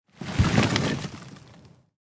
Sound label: armor; smite; geb